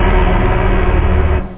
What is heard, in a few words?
horror, effects rave; da capo vol1